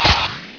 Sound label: hh saw blk; mk2